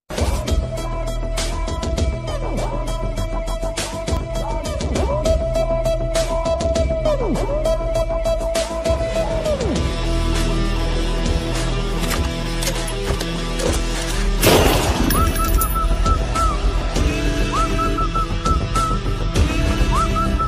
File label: bgms bgm beats telugu; timmarusu